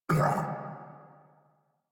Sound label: Pain; Warframe; Eidolon Grineer